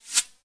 bullet pass; teardown ps5 windows xbox series xs gamerip 2022

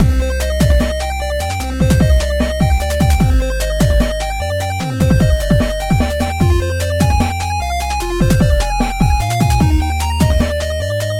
Arcade Music; WrestleQuest

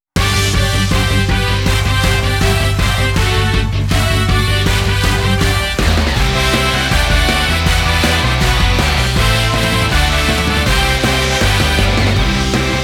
Hitting March; Family Stadium 2020

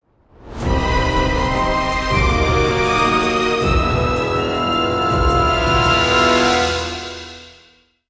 Sting; Aces of the Luftwaffe Squadron

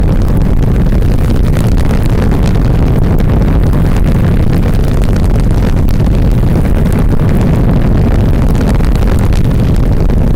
tools booster loop

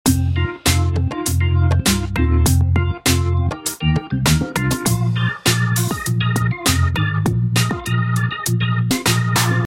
Menu Loop; Sokoban Next

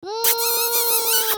Caramel Miss Sound; Jolly Battle; Android

